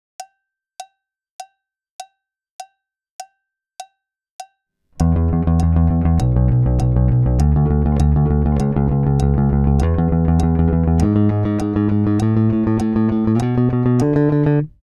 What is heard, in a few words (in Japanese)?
オルタネイト・ピッキング練習フレーズ